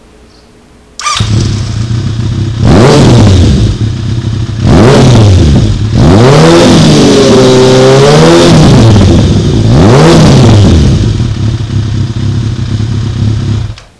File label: explorer, muffler